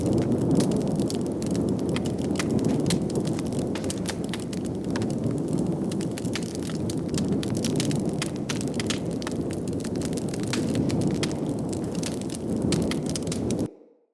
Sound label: Fire Hazard Burn Pile Large Loop A; Undercity